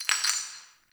Cave Ocean Rock Crumble; Ambience; Midrath Island

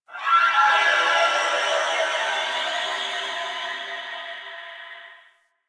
Chimera Portal Cry One Off; Ambience; Warframe; DE

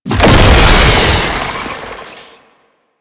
Tank Infested Postule Explosion; Enemies; Warframe; Lasrian

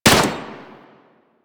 Pistol Shot B; Weapons; Warframe; Tenno; Guandao

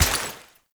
Step Water, Footsteps

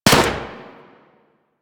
Pistol Shot A; Weapons; Warframe; Tenno; Guandao

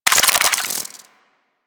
Infested Ashen Move Start; Warframe

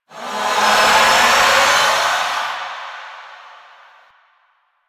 Light Activate; Warframe; Eximus; JadeLight

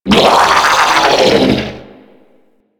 Corpus Scream; Enemies; Abilities; Warframe; Amalgam

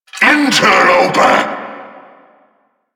attack vocal; Warframe; Duviri; Dax; Vocal Set B

